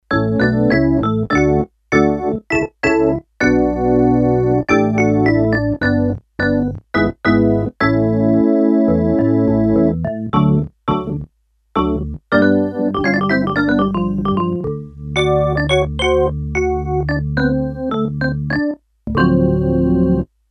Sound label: Organ, Korg DS-8; Factory Patches